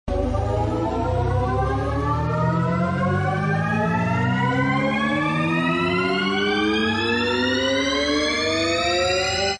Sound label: Scatter Speedup; hockey